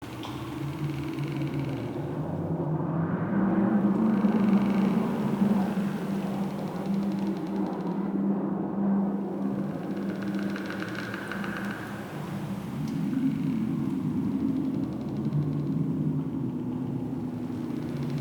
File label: Cave Amb Loop, ambience; Caves; Glades Of Andurin